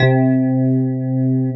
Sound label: FM ELP C2, Keyboards Old School; USB Soundscan vol.09; AKAI; FM ELP 3